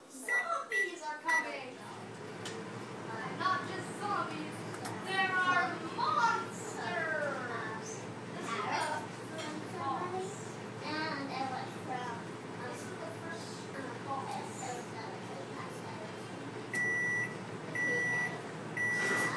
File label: microwave GE 1200W close